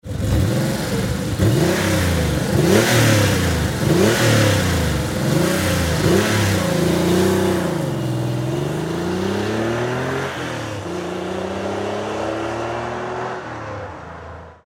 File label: Porsche 911 Typ 964 Valve closed